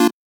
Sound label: Chord